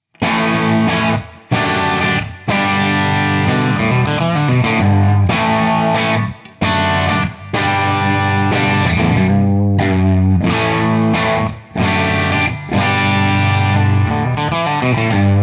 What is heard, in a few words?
Artisan CH2 Hi Input Warm Gain half